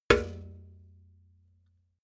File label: metal bucket hit